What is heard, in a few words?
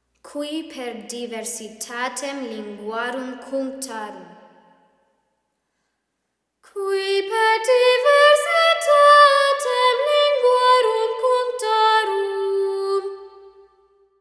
qui per diversitatem